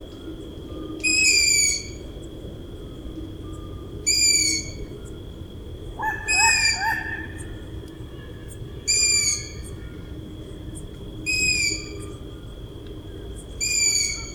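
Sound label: long-eared owl voice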